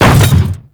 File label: Hammer Boss Step Default, footsteps; Warframe; Grineer Hammer Boss